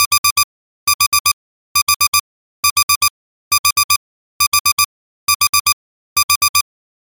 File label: household alarm clock beep tone